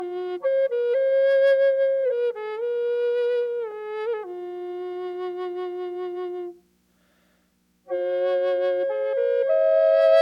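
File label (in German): Triple Fis ohne, drone flute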